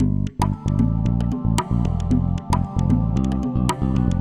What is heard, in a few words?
perc Bass